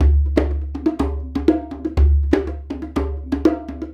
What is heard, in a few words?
Combo, Essential Percussion